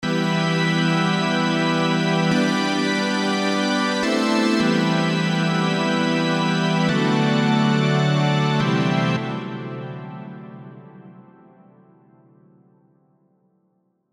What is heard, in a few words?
Synth